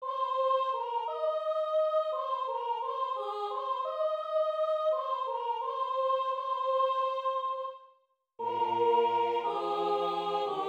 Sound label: Haec Dies Soprano dominant